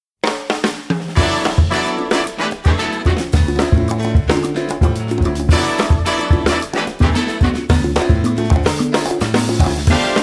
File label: Styrian Mountain Dance